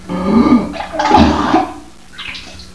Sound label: Vomit